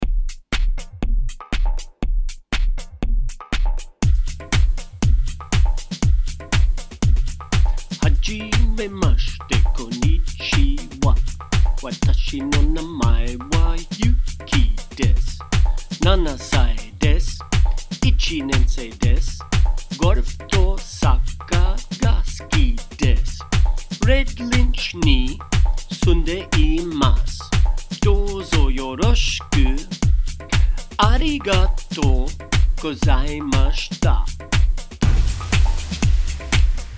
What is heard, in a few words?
rap hajimemashita